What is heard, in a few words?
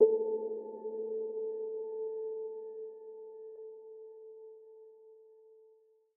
Long Resonant Title